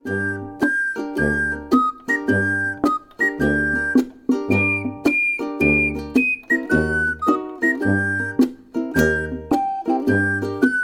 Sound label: Ukulele